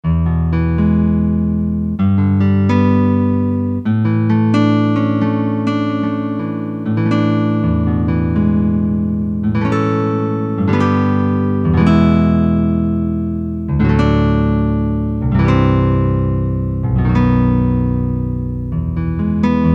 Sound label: G. GUITAR, Korg DSS-1